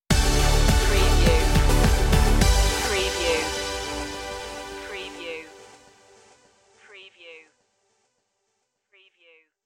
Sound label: sparkle stinger